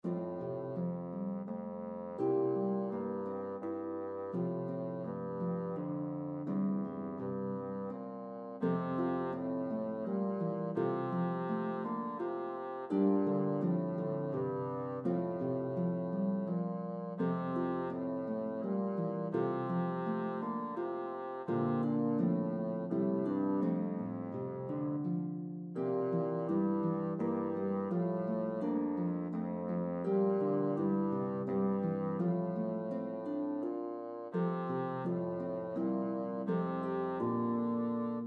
Bassoon Harp, Duet for Bassoon & Harp, French Lullaby; This French Lullaby is perfect for setting a calm, meditative ambiance.; Infant So Gentle